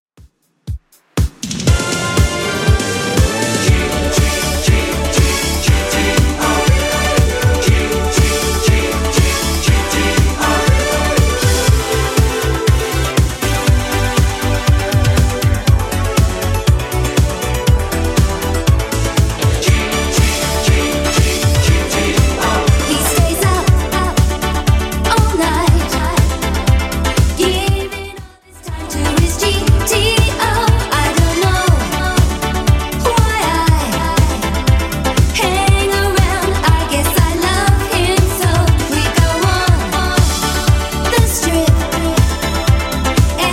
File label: 80s Redrum 120bpm